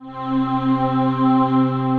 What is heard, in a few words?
Formant Choir, disembodied voices; Instruments; Ableton; Mood Reel